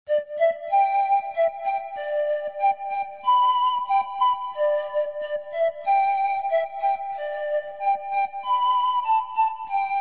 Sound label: Orchestra Panpipes, How Firm A Foundation (Medley)